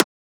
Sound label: Transient; FLPRO